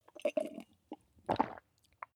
tea drink

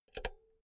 insert cinch heavy

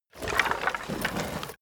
bones rustling movement

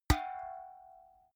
big can empty hit hard

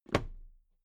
toolbox close drop lid soft

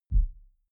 sub bass soft

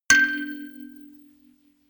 notification kalimba rattle third

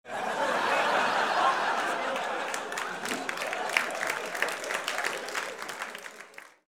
laugh applause

